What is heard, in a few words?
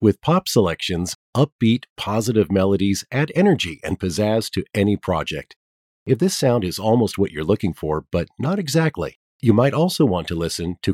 Pop, music on hold